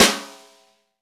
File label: snare, Roland